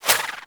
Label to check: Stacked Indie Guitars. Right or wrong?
Wrong